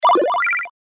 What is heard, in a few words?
charge full; ZTE E520-Agent